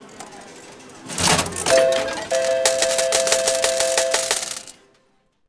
slots; summer of our discontent; slot canyon slots; the summer of our discontent act 4 prizes

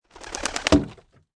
invisible house; thoh19

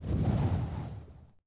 sfx currency tumbleweed; summer of our discontent; the summer of our discontent act 1 turbo tappin

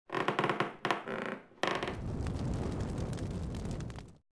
sfx pilgrim house; the mayflower maple bowl; premium combo puritan lovejoy

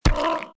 pet zombie squish

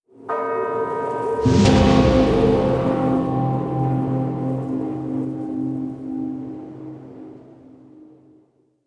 sfx frontier town; northward bound

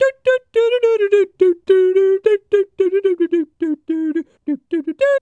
ballet tap; ruth powers; homers ballet

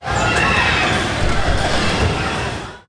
bumpercars; simpsons babies; captain dodgeem

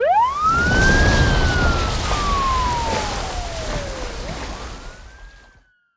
police boat; breakout bounty

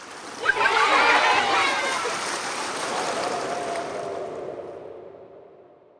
the void; splash and burn

